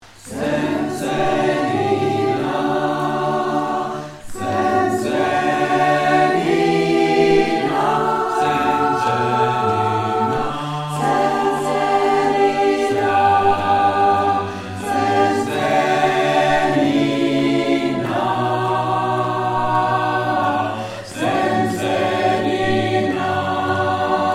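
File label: all soprano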